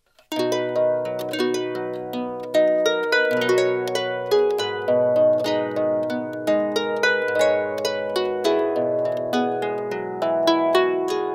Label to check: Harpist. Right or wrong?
Right